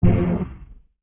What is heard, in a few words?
Grave Hurt; Sid Meier's Civilization 4 Complete; Beyond the Sword; Units